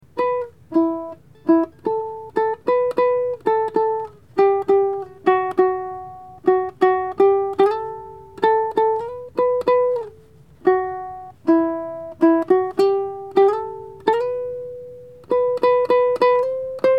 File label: Sad Uke